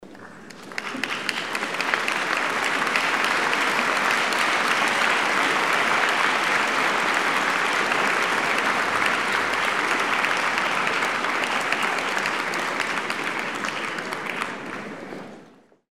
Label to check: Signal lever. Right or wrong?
Wrong